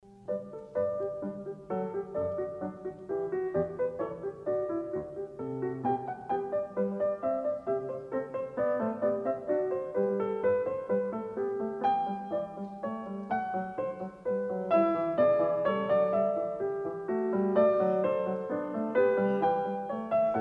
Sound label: Bach Goldberg Variations